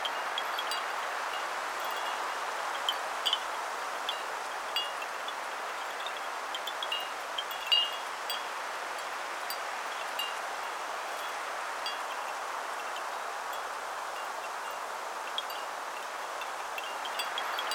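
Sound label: Ambience Dojo Cold Loop; Fruit Ninja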